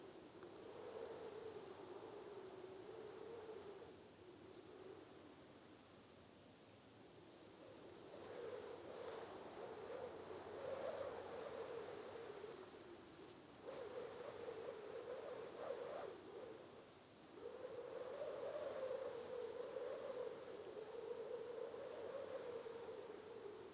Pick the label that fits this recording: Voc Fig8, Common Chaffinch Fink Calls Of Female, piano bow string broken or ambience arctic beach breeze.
ambience arctic beach breeze